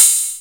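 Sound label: open hi-hat Korg KPR77; Best Service Gigapack 2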